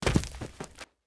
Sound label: drop, barbarian infantry; monster